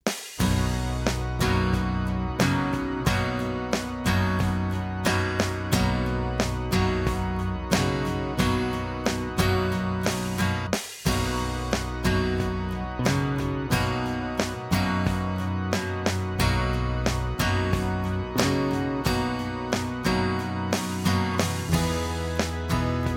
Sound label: Mix With EQ